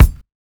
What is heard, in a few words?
KIT, Dance Mega Drums, HIP; Best Service